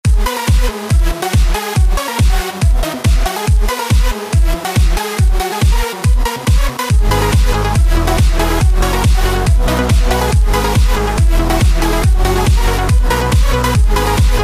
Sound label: trance night club ringtone